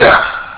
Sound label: combat; openrsc